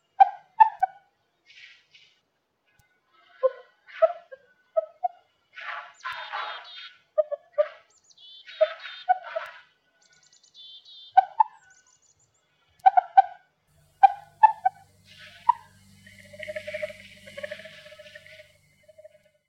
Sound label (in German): Mauswiesel Mustela nivalis